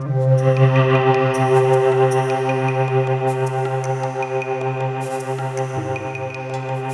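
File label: wave sequence, synthesizer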